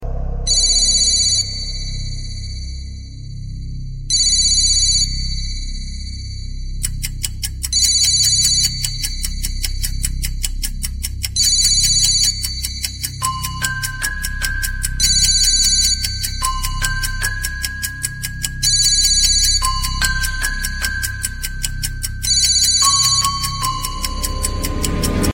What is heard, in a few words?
business tone